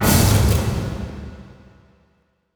Sting

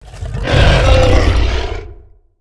rancor; Star Wars Knights Of The Old Republic I; XBOX

